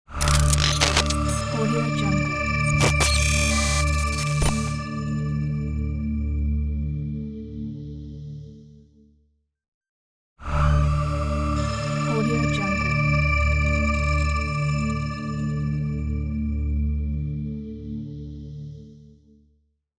atmospheric glitch logo

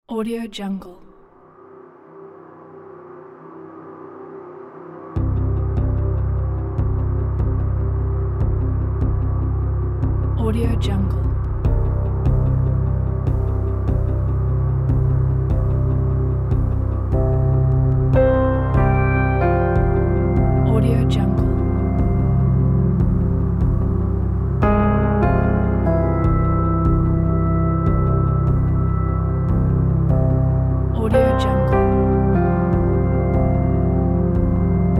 cascading dark energy

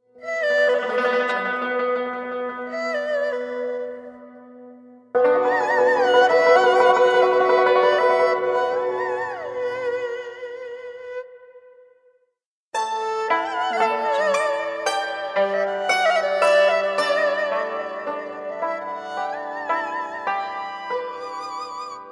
chinese zen logo

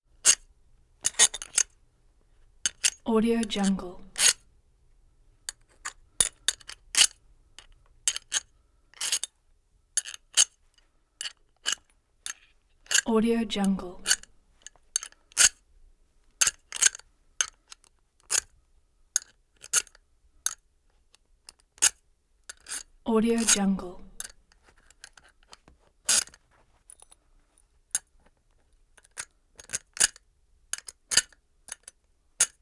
bolt scrape; prosoundfx

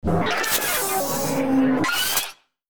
hydraulic machine release

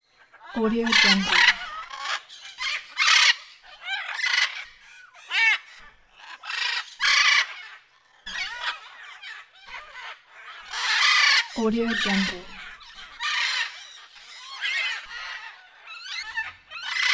jungle animals

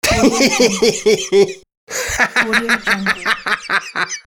laugh

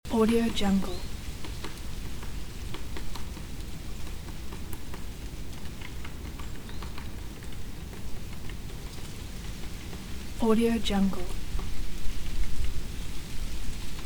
windy forest humming loop

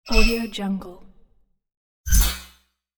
digital gust item

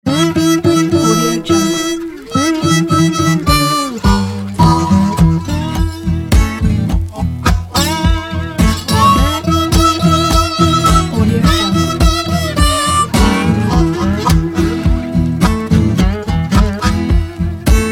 morning blues with vocal

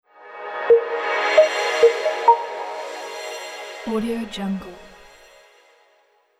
short media tech logo

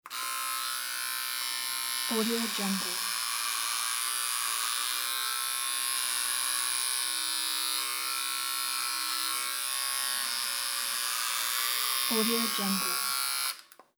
shaving head with electric shaver

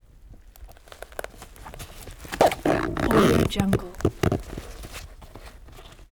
zipper open close

